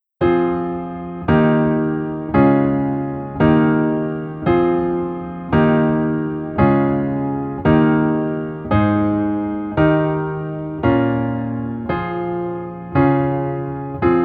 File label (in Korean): Piano ver 멜로디 MR